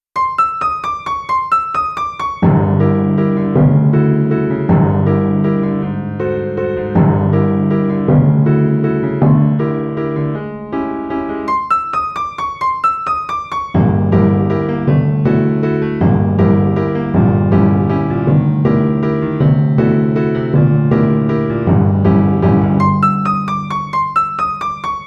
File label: piano timpani; kolotoc carousel